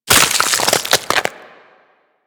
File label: Ice Freeze Hit; Warframe